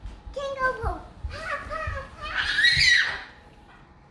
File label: Kangaroo hop